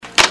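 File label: typewriter c; machine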